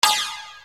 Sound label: Hat, EuroDance